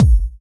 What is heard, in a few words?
BD; Best Service; Dance Mega Drums